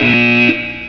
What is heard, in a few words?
buzzer; LoseChance; 300 games for mac; Arcade; JetFrog 3.0.4 c